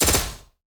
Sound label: Assault Rifle; SoundsCrate